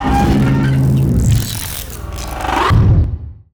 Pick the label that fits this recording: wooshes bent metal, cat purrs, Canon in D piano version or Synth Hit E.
wooshes bent metal